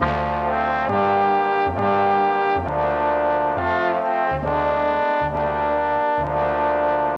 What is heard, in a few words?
horns, Loops